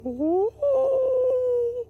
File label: Oooo